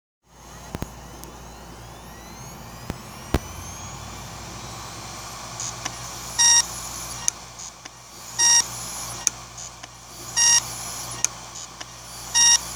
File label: Hitachi 1TB HDS721010CLA332; Hitachi 1TB HDSLA332